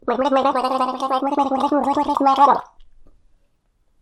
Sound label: woman gargle; human